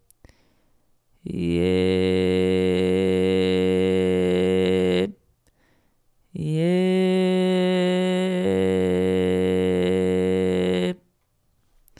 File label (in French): creaking regulier; les effets simples